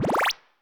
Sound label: UI Skip; They'll Come from the Moon